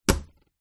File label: crutches on wooden floor single step; foley